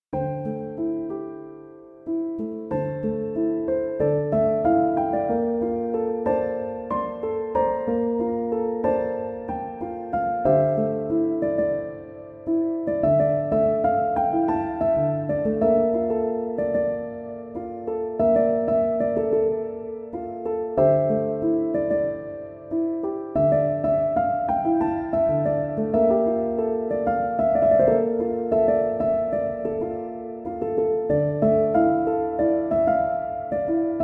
piano instrumental

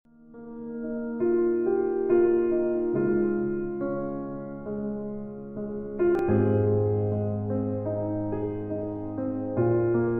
Peaceful Piano

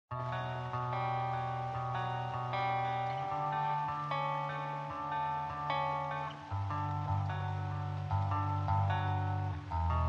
Lofi song

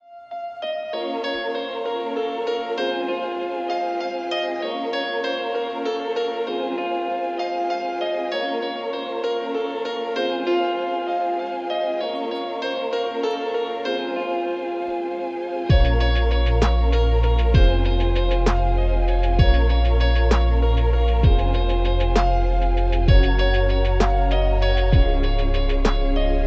instrumental lofi

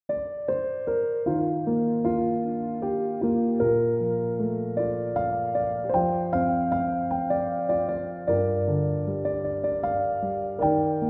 piano instrumental

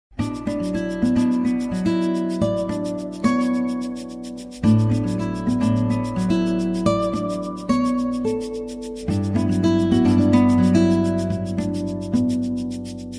Chill Guitar

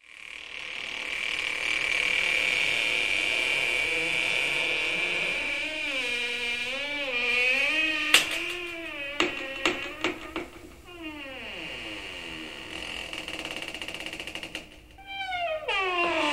The Beating In Your Chest, sonidos terror

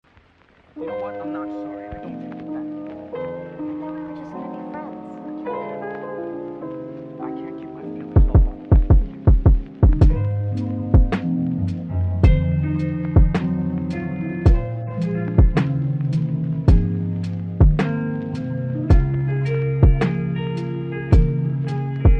Lofi

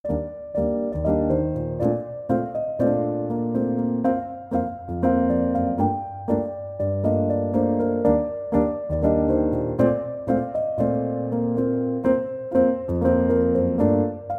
piano instrumental

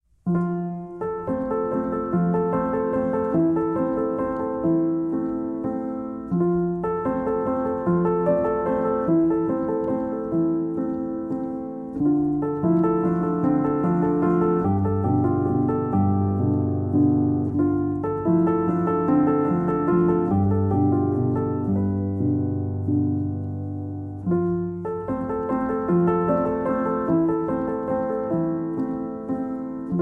Solo Piano; Pop instrumental

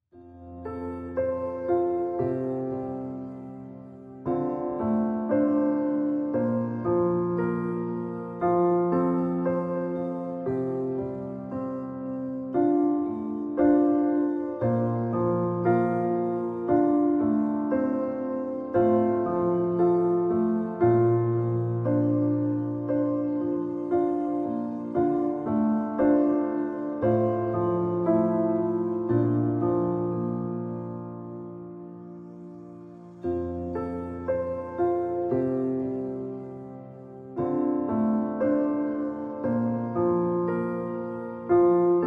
Piano Relaxation

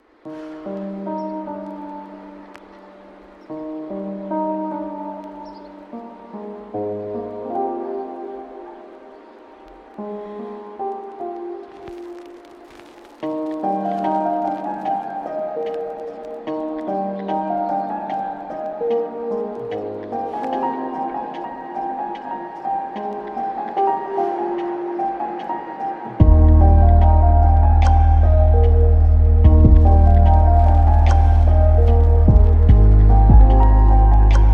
instrumental lofi

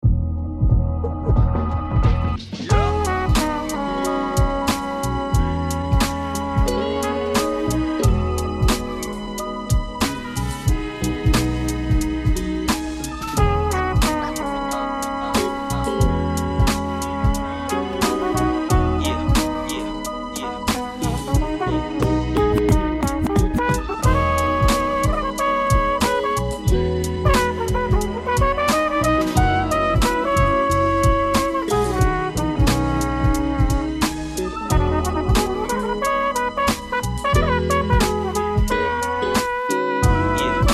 Rooftop Jazz; Soul - Jazz - Chill

